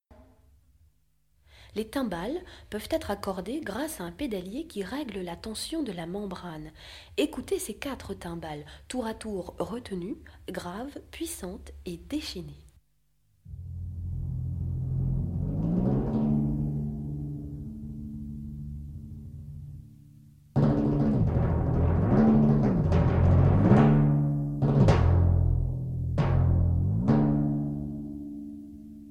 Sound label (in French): Timbales extrait